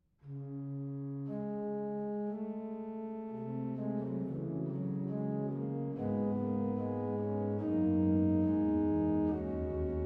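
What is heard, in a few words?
Improvisation, organ; 1699; Starck; Zlata Koruna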